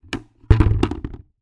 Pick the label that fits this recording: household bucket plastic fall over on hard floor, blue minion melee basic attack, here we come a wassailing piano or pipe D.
household bucket plastic fall over on hard floor